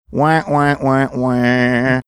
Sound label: making sad trombone sound